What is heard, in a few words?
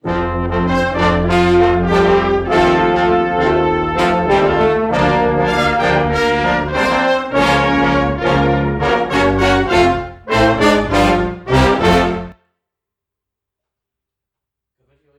BRASS; AGFEO